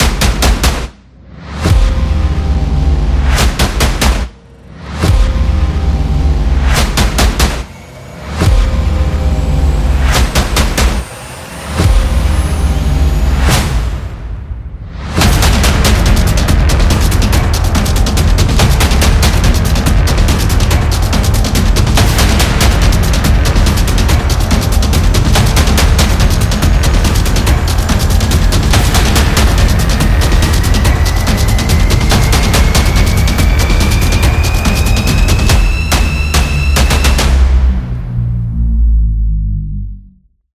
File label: Trailer Intro Powerful; suspense